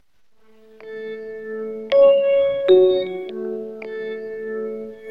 ring

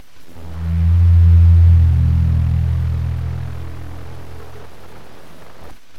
deep breath

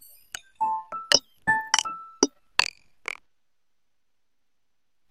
sfx phone vibrate

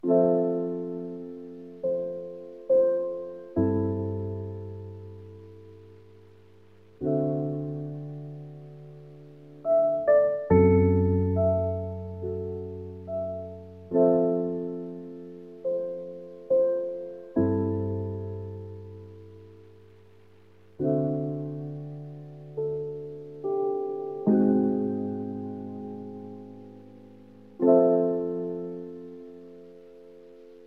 bgm main loop

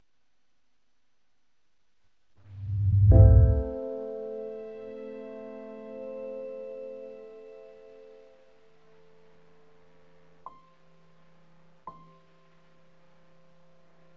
bgm suspense

